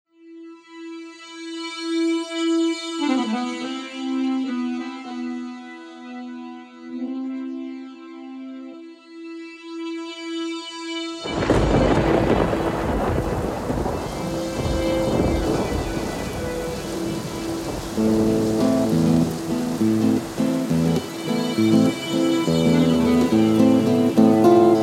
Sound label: Musette